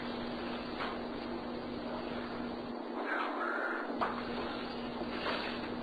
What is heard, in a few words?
basement filtered